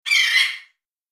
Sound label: bird yell; Quicksilver